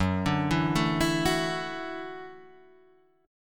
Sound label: Gb Minor 7th Sharp 5th Gb Guitar Half Step Down